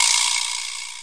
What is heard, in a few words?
vibraslap; Western and Latin Percussion; single hits